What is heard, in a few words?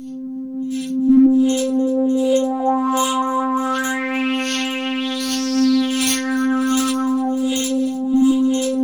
BELLS RESY, RESONAN DRONE; Zero-G Ambient 1